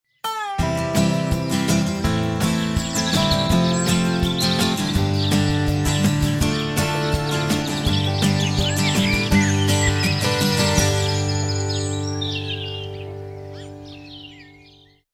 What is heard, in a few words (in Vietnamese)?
Nhac nen chim keu vui ve vao sang som mua he; tiếng chim hót